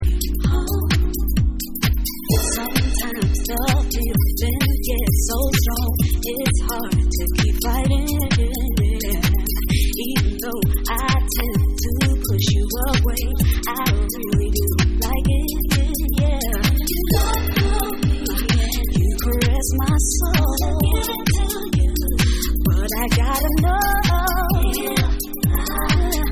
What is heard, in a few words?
Vocal Dub Mix